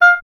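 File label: oboe, wind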